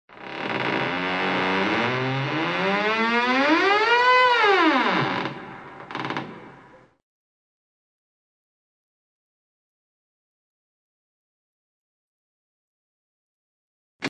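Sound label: Exaggerated door creaks; Utrirovannye dvernye skripy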